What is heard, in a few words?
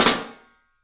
PICSNF, 3D Drums, PICOLO FLAM; 3D Sound